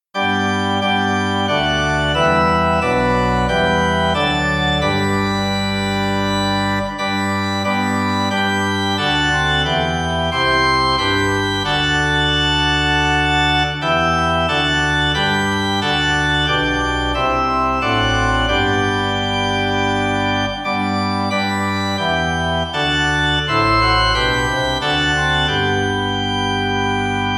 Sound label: Old Hundredth organ